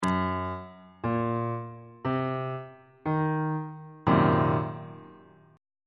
d double flat half diminished 7th 2nd inversion on bass clef